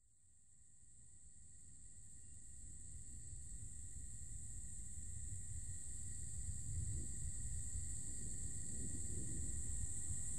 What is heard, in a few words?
thunder; Thunder Downunder; A near-suicidal recording of God by wOnKo one stormy afternoon